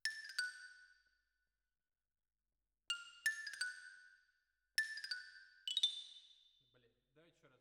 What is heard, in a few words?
Xylo